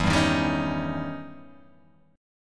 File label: E Minor Major 13th E Guitar Open G